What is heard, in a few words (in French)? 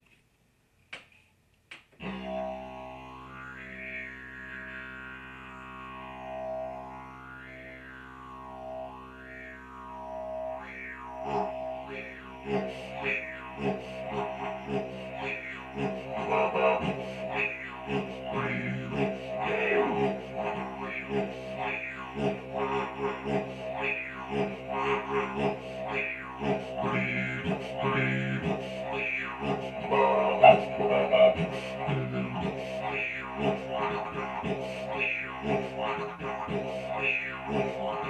impro, didgeridoo